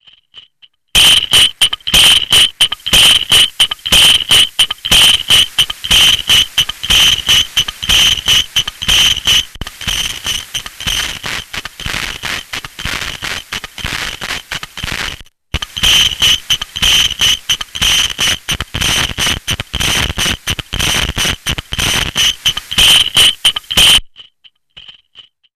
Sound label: Convulsion; audibleDisease; noDist